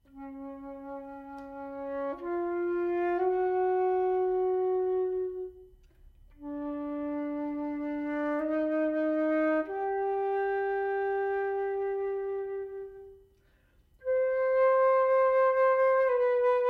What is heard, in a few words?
wind quintet andante